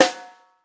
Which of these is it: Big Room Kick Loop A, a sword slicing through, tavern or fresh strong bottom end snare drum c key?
fresh strong bottom end snare drum c key